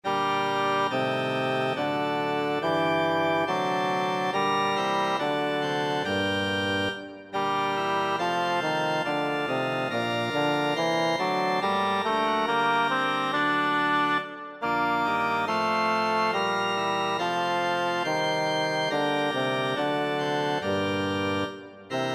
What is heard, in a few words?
choral bass, kantate; 07 choral; bach johann sebastian; kantate bwv 048 ich elender mensch